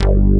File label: TECHNO BASS; Pro-Rec - Synthsonic; BASSES